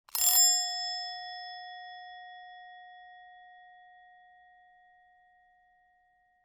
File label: BELL DESK